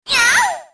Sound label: hurt; snes; smas; venus